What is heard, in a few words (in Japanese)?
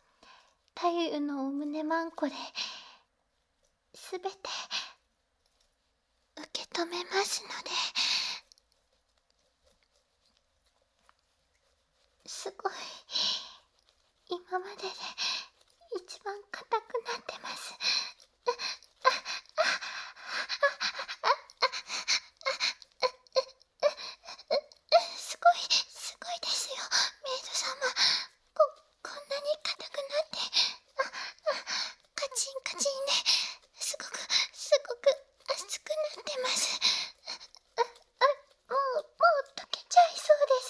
オイルマッサージASMR